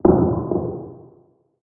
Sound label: Random Rock